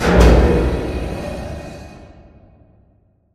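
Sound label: Prop Rack Start Distant; Prop Warehouse; Titanfall 2; Boomtown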